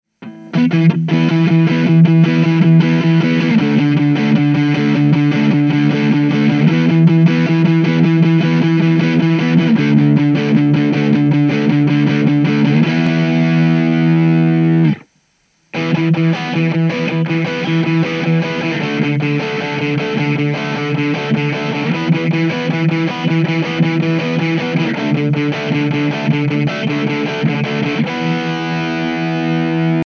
buffer drive

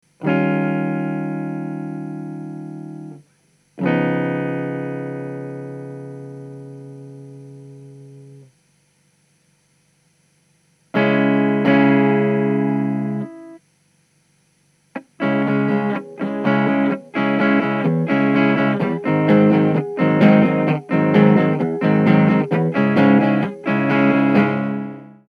bridge clean

